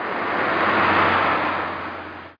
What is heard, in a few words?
car go by; Microsoft Graphics Studio Greetings; Microsoft 1998